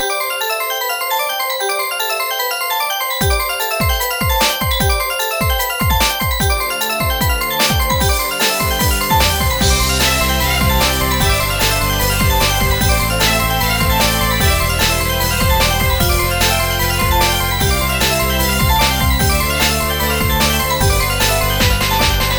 Win Rolling; bikini party online

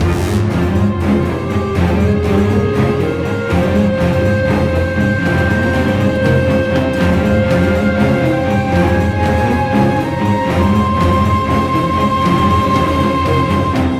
String; assassin s creed unity dead kings

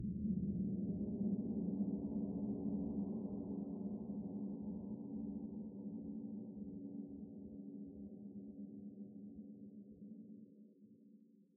Gong; tintin reporter cigars of the pharaoh

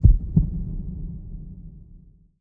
Heart Beat; Summer Catchers; Mirage

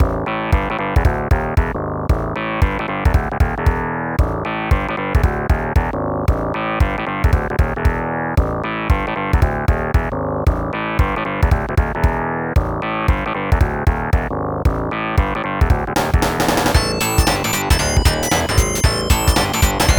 Vine Depths Zone (Instrumental); Friday Night Funkin' Super Mario Bros. Funk Mix

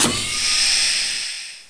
Sound door open

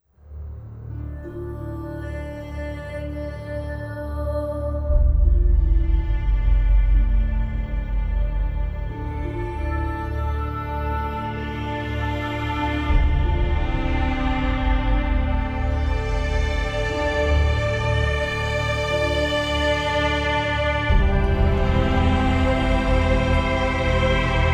Solemn Trailer; rising islands windows gamerip 2016

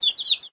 Chicken Idle

